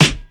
rap snare single hit E key, snare drum sound tuned to the E note; '00s